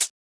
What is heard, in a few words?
Closed Hat; FLPRO